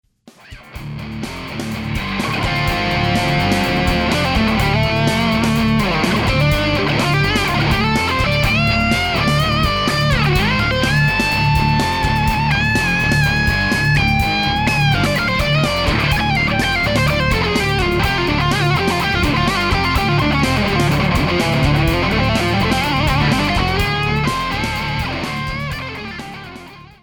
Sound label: Metal solo tonelab; gitar